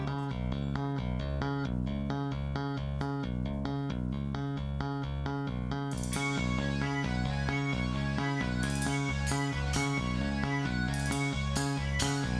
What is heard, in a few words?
bass